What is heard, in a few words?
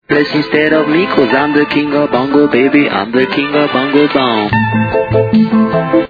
bongobong